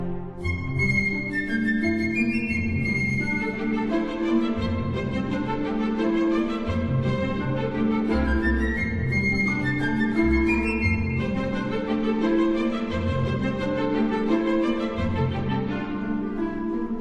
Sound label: tip toe waltz